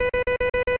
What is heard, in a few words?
alert; mcheli